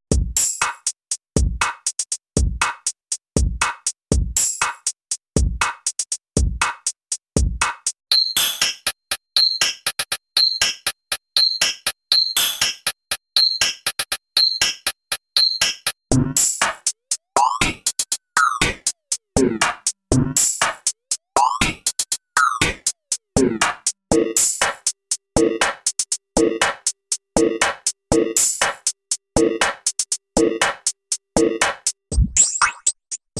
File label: RING MODULATOR Drumloop; CHERRY AUDIO RACKMODE